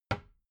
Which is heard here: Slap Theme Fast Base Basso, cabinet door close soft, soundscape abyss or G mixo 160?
cabinet door close soft